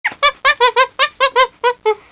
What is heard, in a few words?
CHIMP; Kids' CD-ROM Bible Fun Pack; Education Publishing Concepts; NOAHDEMO